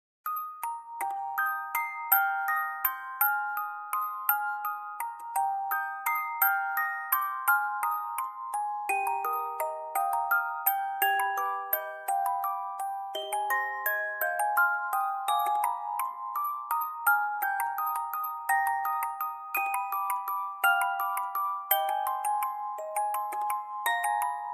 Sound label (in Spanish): Cajita de musica; Danzas de Marte Obra electrónica global inspirada en folklore imaginario